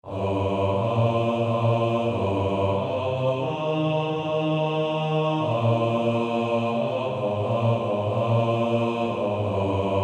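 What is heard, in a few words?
Male Choir bass 1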